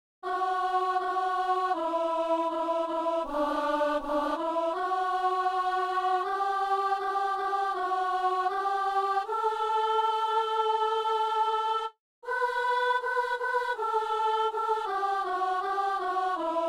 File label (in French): venons tous a la table, alto chant; Saint-Denis